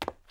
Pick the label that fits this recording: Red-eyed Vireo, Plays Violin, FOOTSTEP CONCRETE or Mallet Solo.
FOOTSTEP CONCRETE